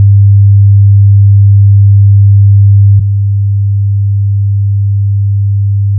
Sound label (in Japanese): 100Hzの音データ/基準音と低減音 [会話音[男性の声]など]; ペアガラス対応